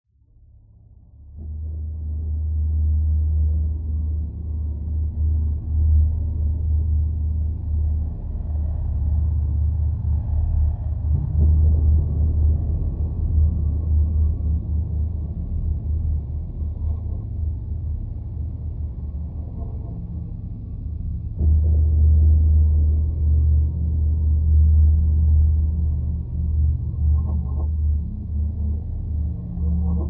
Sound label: Ambiance Flooded Pad